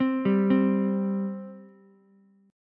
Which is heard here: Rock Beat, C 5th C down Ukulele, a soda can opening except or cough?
C 5th C down Ukulele